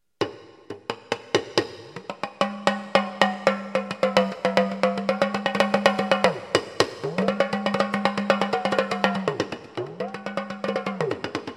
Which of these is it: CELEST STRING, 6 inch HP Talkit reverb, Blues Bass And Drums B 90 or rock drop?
6 inch HP Talkit reverb